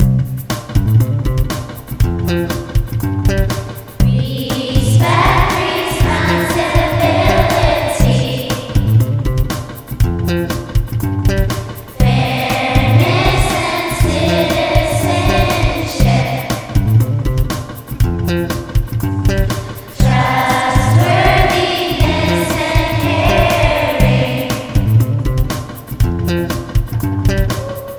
character counts song, students

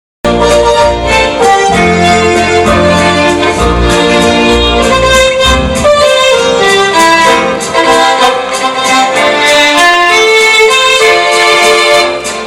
violin lead performed by the polka maestre trio

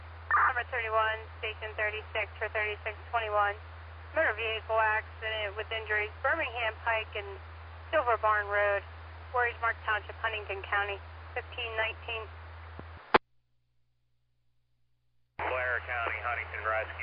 page

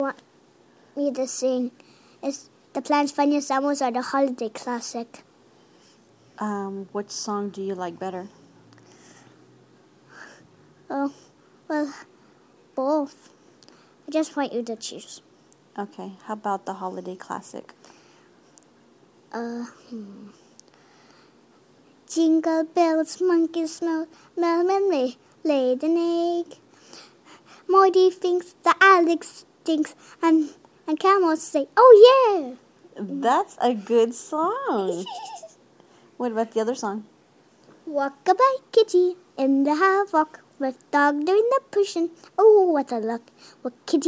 singing 2 short songs

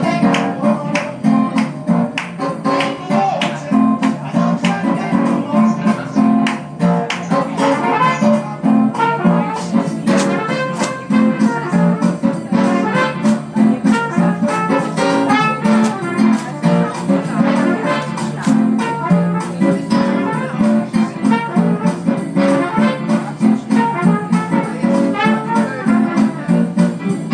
S-Bahn music

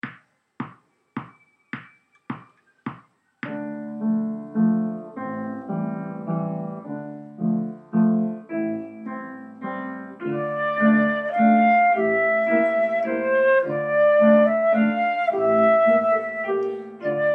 waltz

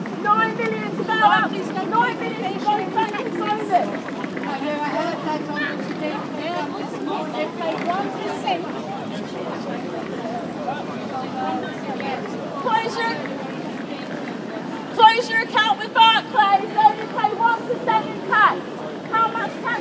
outside barclays bank

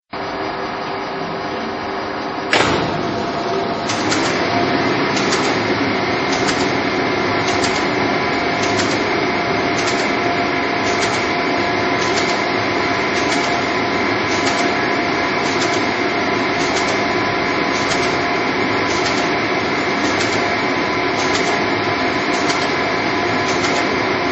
bombe computer at work

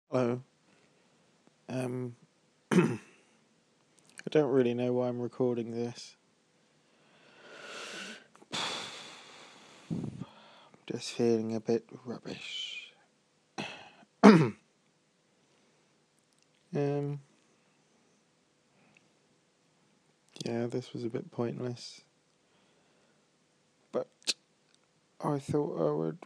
boo hoo

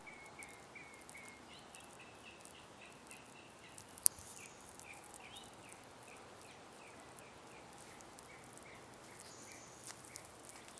woodland hills birds

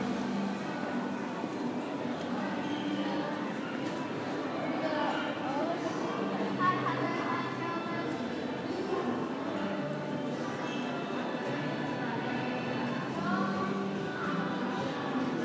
St Johns Centre Leeds ambience

